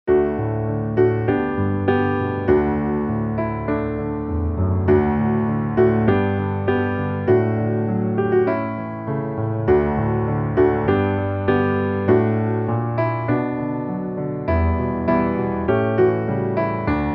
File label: piano